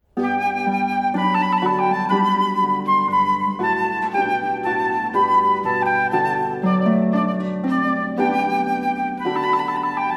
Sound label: Trumpet Voluntary